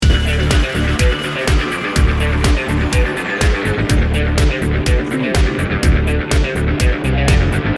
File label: ya wanna go loop